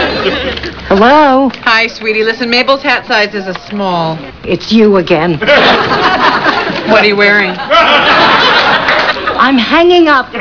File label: trying fire; Schuetzenchor